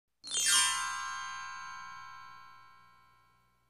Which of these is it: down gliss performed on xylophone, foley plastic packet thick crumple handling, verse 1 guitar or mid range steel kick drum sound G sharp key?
down gliss performed on xylophone